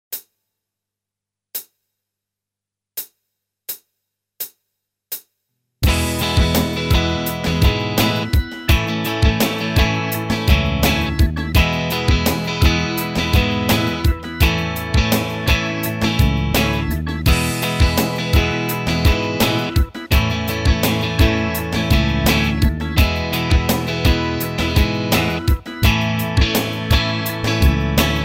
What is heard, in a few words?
Strumming Patterns